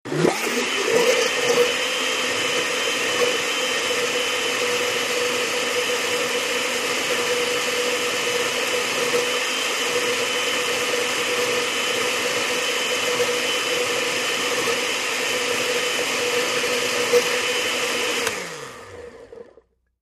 Blender On High Slurp; appliances

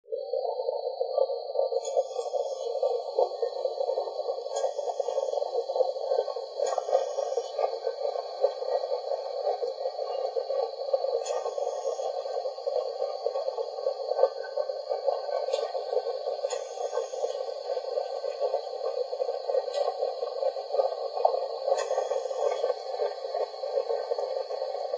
Eerie Hum Synth Under

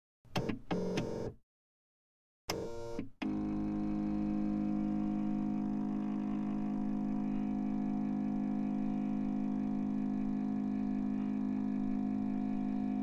Scanner Low Tone Hum, fax scanner copier

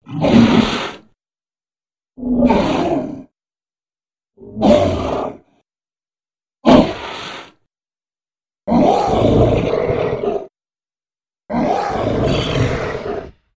Monster Breaths Roa

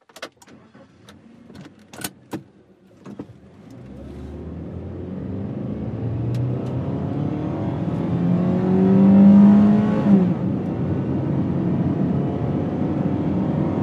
Sm Car Accel 100mph, compact

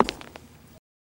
FS Male On Hardwood, singles wood

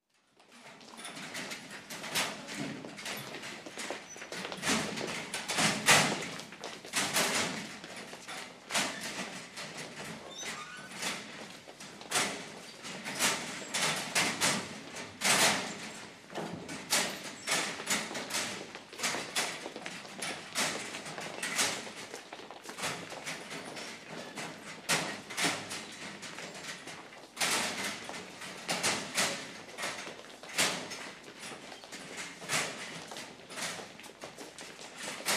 Footsteps School Hal, group

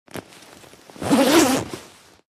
Jacket Zip Unzip, zippers

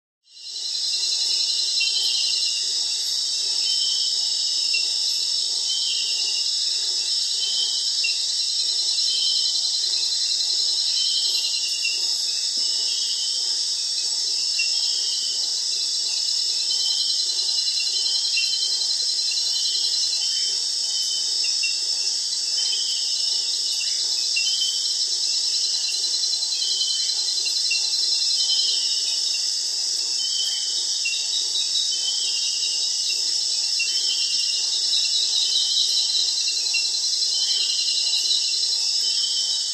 Cricket Chirps

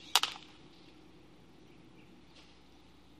Baseball Bat Wood Me; baseball fx & games

